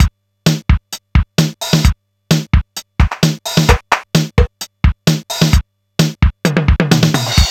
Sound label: RZ-1 + LOOPS